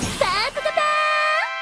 panpakapan; torchlight

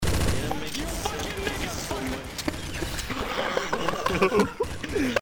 pure Rage; torchlight

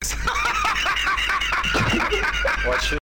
laugh; torchlight

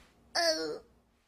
oww; torchlight

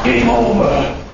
Gameover; torchlight

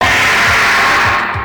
screech; torchlight

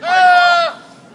goat; torchlight

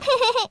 Giggle; torchlight

